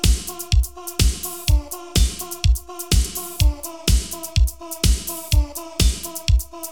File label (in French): Grave sec; Grave mou